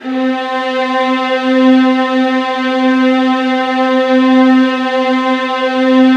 VIOLINS, Synclavier Strings; ILIO

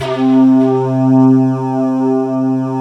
WAVE BLOW C3, Synth

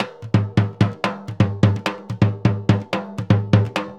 SURDO, SAMBA; Best Service ProSamples vol.14; AKAI

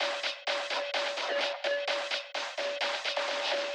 Drum Loop 128BPM FX; VEC5 Drumloops; VEC5 Multi Loops; Loop Elements

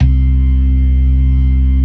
LOW MAN, LOWER MANUAL, Organ

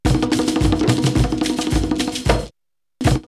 Ouagadougou 108, drumloops World & Ethnic; X-Static Goldmine

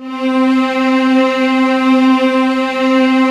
SLOW STRINGS, JV PADS; Pro-Rec - Synthsonic

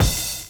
DRUM CHOP; AMG Gota Yashiki Groove Activator

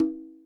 percussion, Millenium MPS-400

